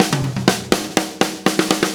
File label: OH BABY